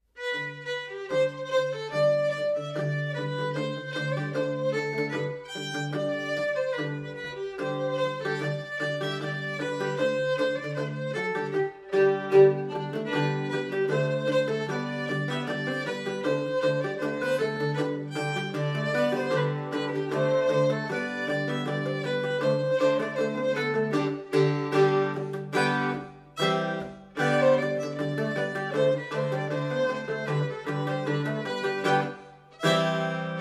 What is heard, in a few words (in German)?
Buendner Polka